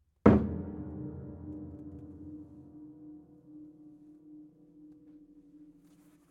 Piano Hits

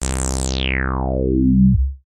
Warp Down

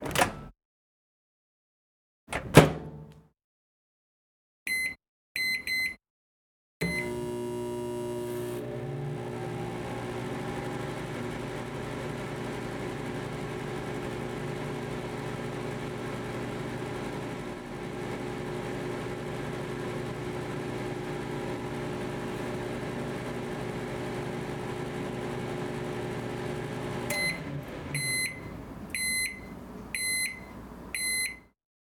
Microwave

